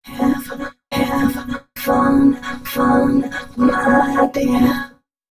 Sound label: female c#, Dance Ultra Voice FX; Mutekki Media